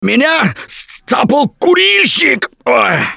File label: Choke, russian, Survivor Voice; Gambler